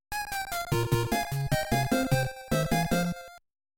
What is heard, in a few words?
Game Over